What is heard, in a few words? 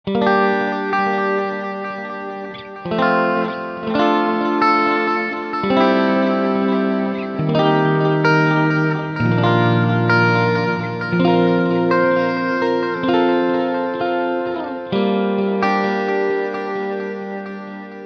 Fender Strat Boss DD7 Roland JC120